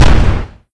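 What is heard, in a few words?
shotgun fire, weapons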